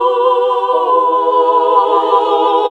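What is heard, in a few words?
classical singing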